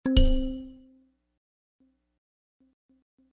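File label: sms sound; zvuk sms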